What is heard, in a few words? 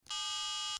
Telephone; Cell Phone Number Key Beeps From One To Nine.